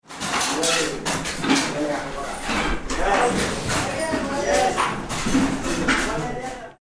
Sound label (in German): dosenherstellung; bangladesch 2009